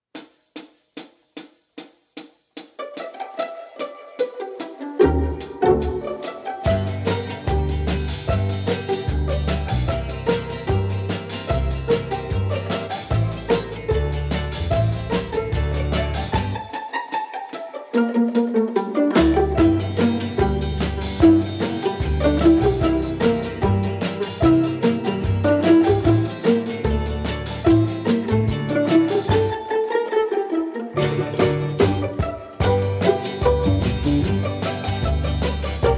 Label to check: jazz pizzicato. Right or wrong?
Right